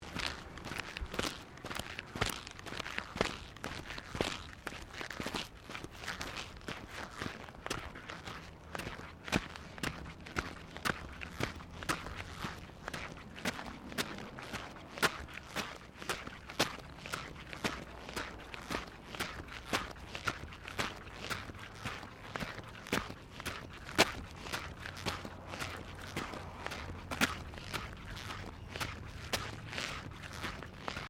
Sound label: Footsteps Concrete Sequence; Crocs; Footstep Foley; Footsteps (Sound Effects:Human)